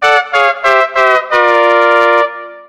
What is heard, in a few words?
game over; theodyssey